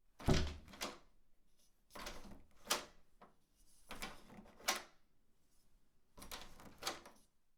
grab small knob

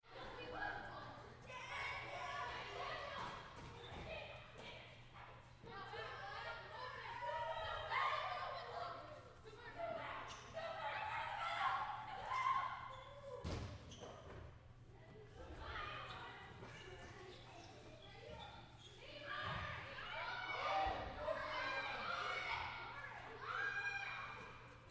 kids playing in gym

